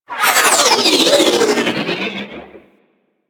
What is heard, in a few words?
Railjack Sentient Ship Roll; Warframe; Enemy Ships; Space Fighter Sentient Coil Agent